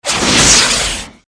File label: agressive swoshes high short